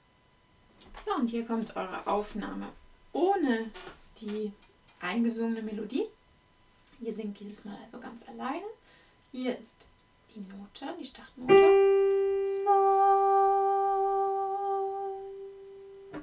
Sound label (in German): Lektüre ohne Melodie